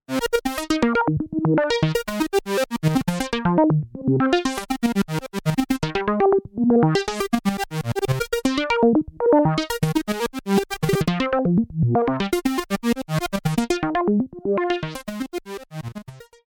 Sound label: Kobol Env Mod VCF